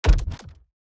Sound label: environment block destruction kick